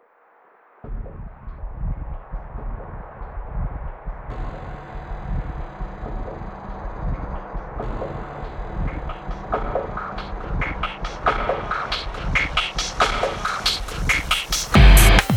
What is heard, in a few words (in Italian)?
Voce lontana Rock